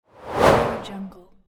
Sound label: transition